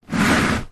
luggage suitcase leather laying on side drag push hard ground short; foley; zapsplat